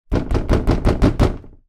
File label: household door knocks hard with fist x7; zapsplat